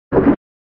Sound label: SNES Fighting Swing